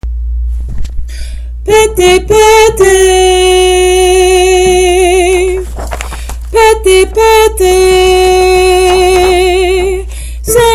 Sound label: soprano